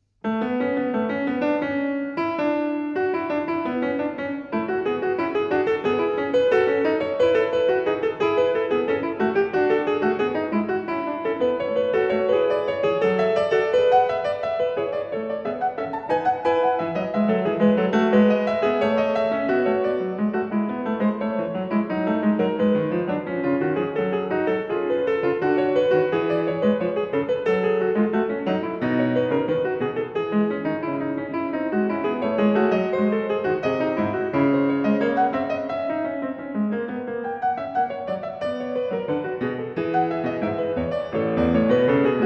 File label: Fugue No. 19 in A Major, BWV 888; Bach; The Well-Tempered Clavier